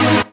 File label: Synth Shot; Vengeance Samples